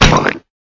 Piggy Tap; Clickergame

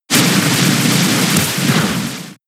Rapid Spin; Pokemon; gen 6 attack moves; XY ORAS

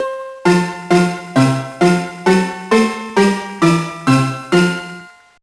All Candies Collected; The Polar Express; GBA

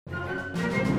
Music Pirates Main 128bpm

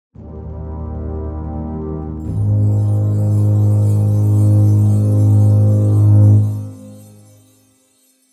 Brass Stinger; BIOMUTANT

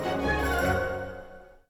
Music Dialogue Joyful End 60bpm

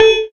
Combo

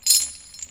Chain Impact

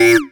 Pride Combo

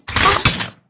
Robot Idle; Clickergame

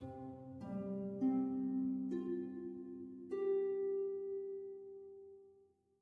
title end 100bpm

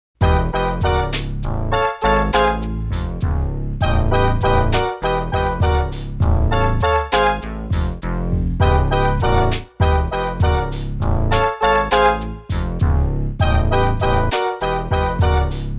Boss Conversation BGM; Bangai-O Spirits Audio Collection Plus

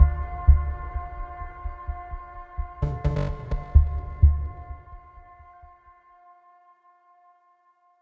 Music Epic Hits City Fight

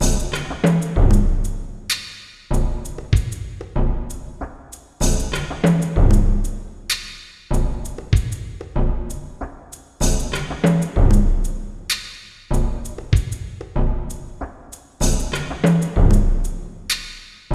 Background Drumming Atmospheric; Seven Spirits

